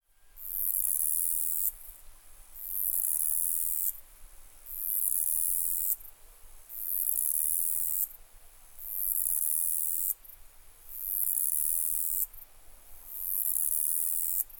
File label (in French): ephippigere